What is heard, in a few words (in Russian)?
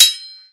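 меч высокий резкий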